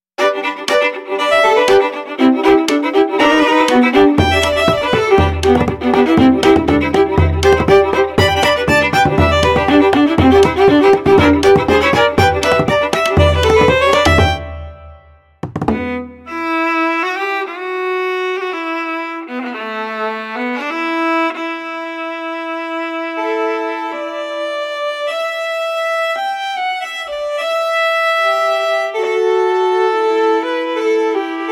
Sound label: celtic fiddle; Travel Pal; red room audio; traveler series; kontakt